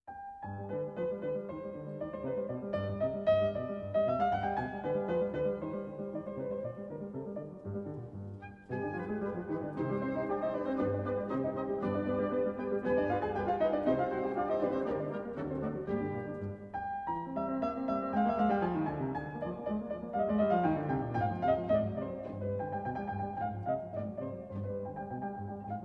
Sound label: Piano Concerto in C Minor, Rondo. Allegro; Beethoven; Piano Concerto No. 3; Op. 37 III.